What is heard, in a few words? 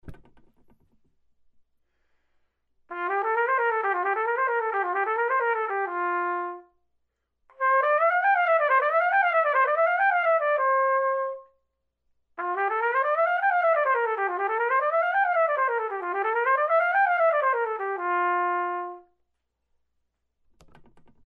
scale, trumpet